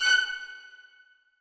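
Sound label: orchestra; Masterbits - Switch FX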